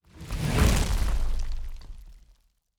Nature Spell, Magic Sounds; Fantasy Sounds Bundle

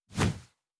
Wing Flap, Monsters; Fantasy Sounds Bundle

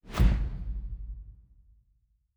Special Click; Fantasy Sounds Bundle

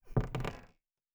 Wood Creak; Fantasy Sounds Bundle; Craft

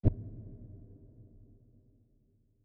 Special Click; Fantasy Sounds Bundle

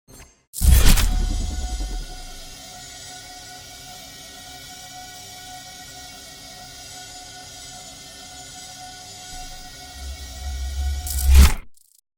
Portal Sound FX; Heroes of Hiis

